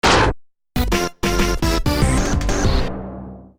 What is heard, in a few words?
Miss...; paper mario the thousand year door; Super Bowser Bros.; GameCube